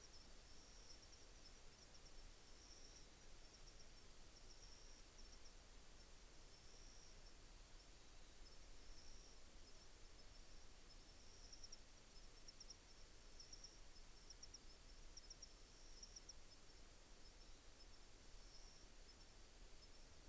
Nocturnal shrubland New Caledonia

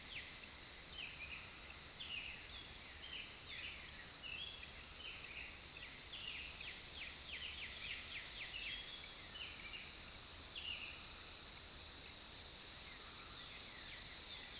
Temperate dawn chorus USA

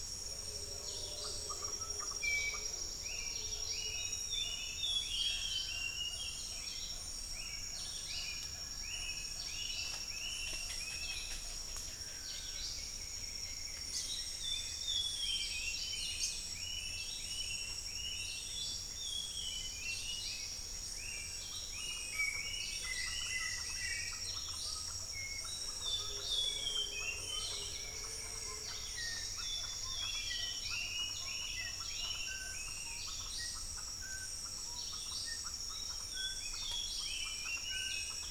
Tropical dawn chorus Panama

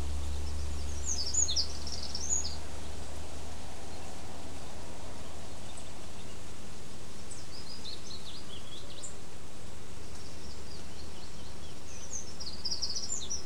Eurasian wren

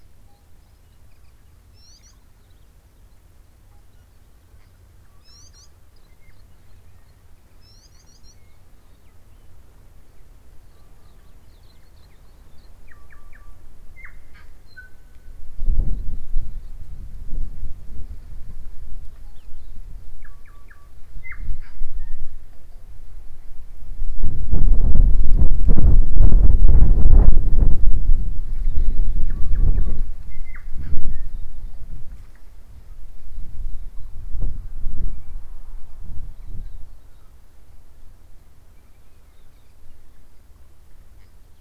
Wind and birds New Zealand

